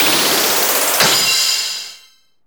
SE GAME SLIDE; maimai

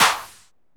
SE GAME GOOD; maimai

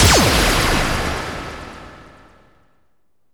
SE GAME BREAK; maimai

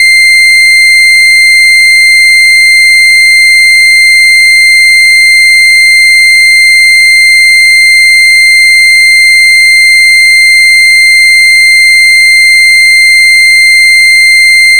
Ring bell; 音MAD; 蓝蓝路; C8

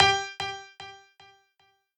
piano sabi

